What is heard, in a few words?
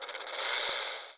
ambient; mahlendur